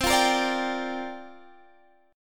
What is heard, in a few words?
C 7th Suspended 2nd Suspended 4th, C, down, Guitar, Standard; strummed; capo=2